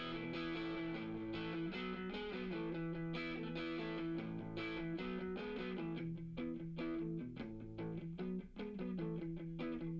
drums, live rec

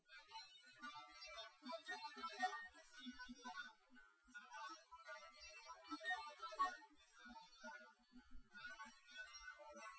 nodrums liverec 250ms 5cm; SiSEC08; SiSEC underdetermined

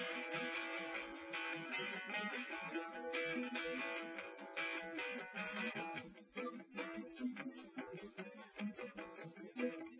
wdrums liverec 250ms 5cm; SiSEC08; SiSEC underdetermined

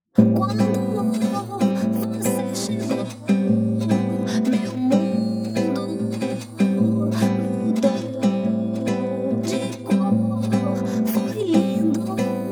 guitar; SiSEC11

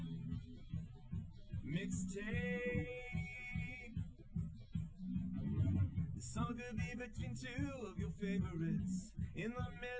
wdrums liverec 250ms 5cm; SiSEC08; SiSEC underdetermined

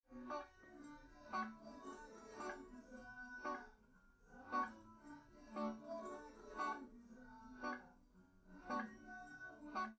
test no drums live rec; SiSEC11; underdetermined

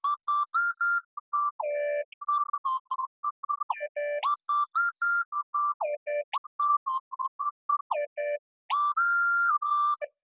nodrums; SiSEC10; underdetermined

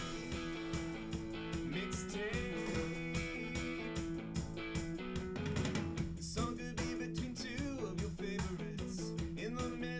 wdrums liverec

